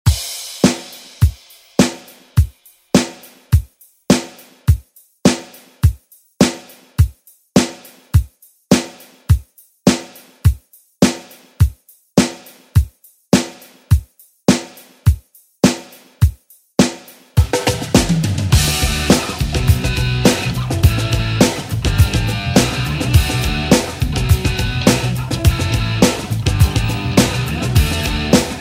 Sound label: 90s Re Drum; DJ Mhark